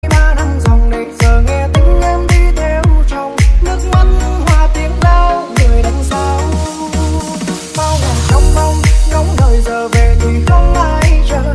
EDM Remix

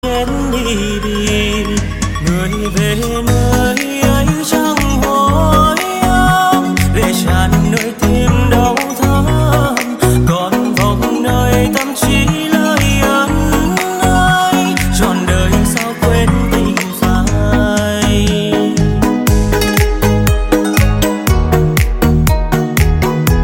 Remix

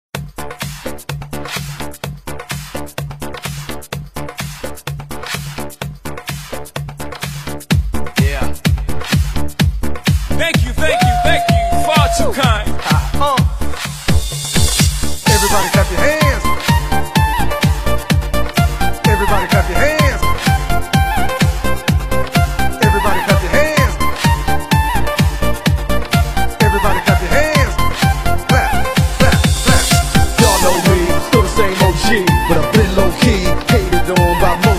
China DJ Remix Bass Căng Đét

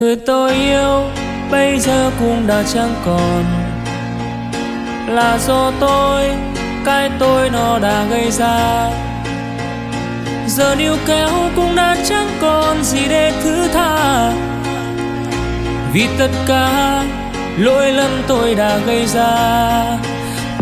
Vietnamese Cover

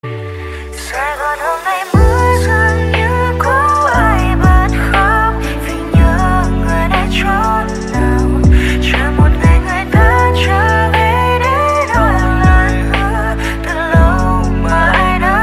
Lofi Version

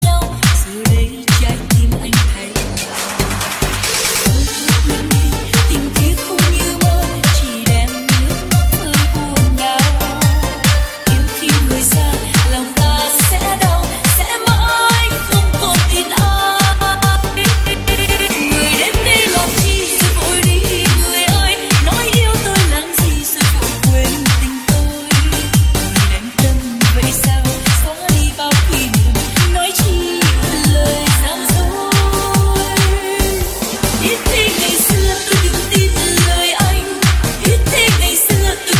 Remix

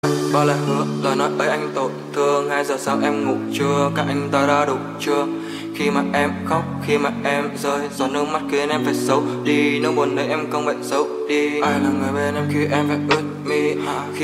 Lofi Version

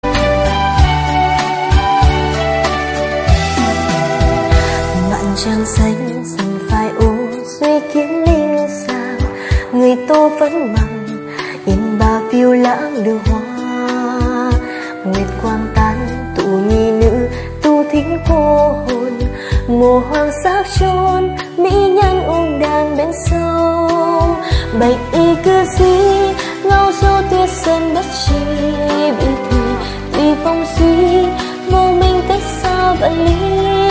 Vietnamese Cover

